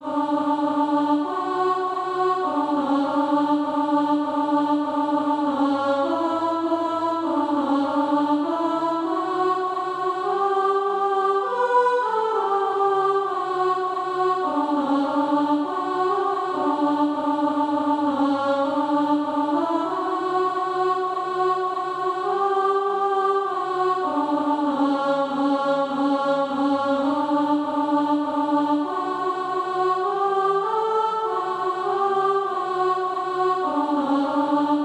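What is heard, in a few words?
alto, Practice Music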